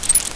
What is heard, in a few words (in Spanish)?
Rupia Gastando; RPG Maker; Legend of Heroen v1.20; Zelda